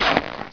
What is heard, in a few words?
dirt, player; wartso